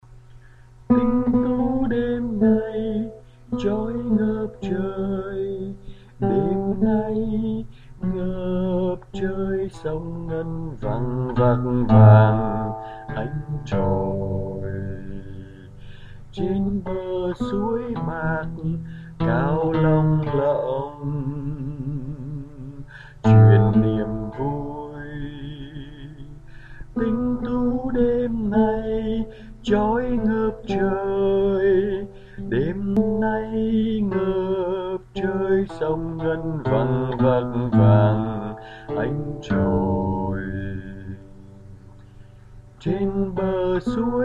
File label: Tenor